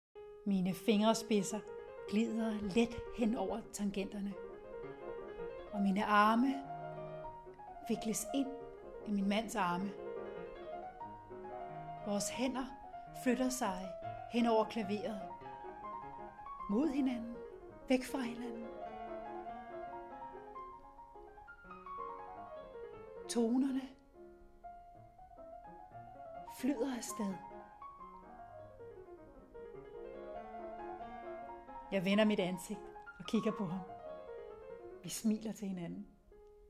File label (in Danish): Klaveret